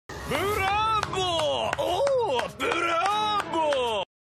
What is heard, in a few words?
bravo ooooh